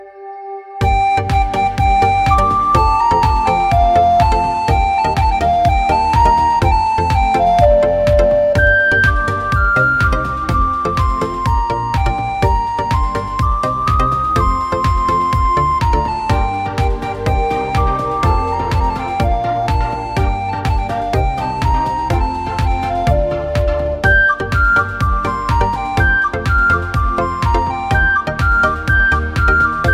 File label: Ringtone Mix; Airtel